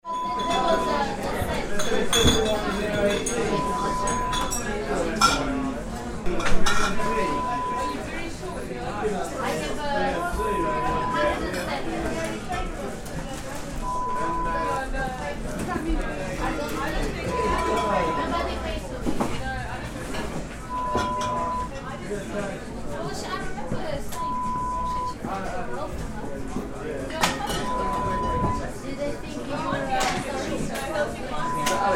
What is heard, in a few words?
Bar sound